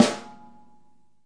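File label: snare, Multisampled Real Kit; REAL LIVE KITS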